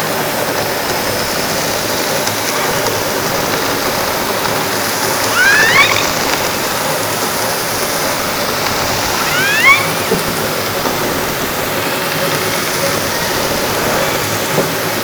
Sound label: Orca sound Night Passby